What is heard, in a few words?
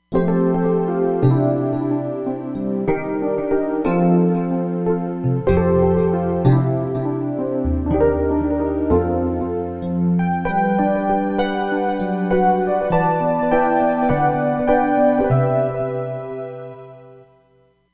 Organic FM, Roland Fantom XR